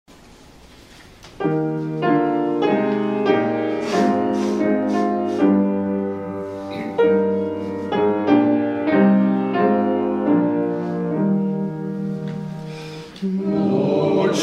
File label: Hymn; Lord, should rising whirlwinds